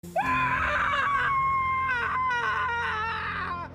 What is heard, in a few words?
Scream; Hot Coffee